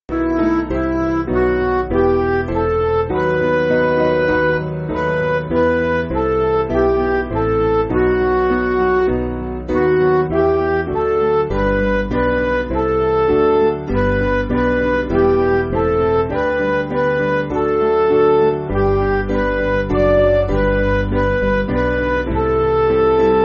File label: Midi